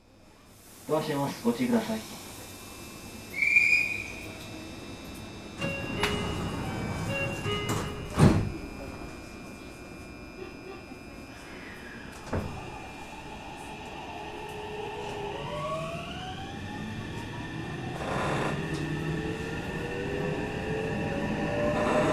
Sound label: meitetsu 3300, train motor sound